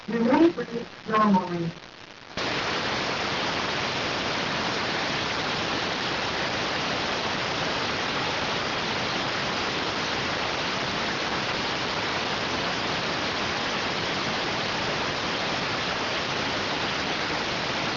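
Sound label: radioteletype receive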